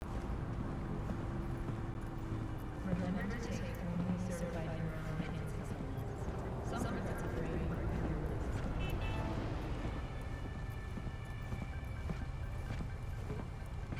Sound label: audio sample walking through watson; Cyberpunk 2077